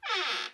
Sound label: creak; Farm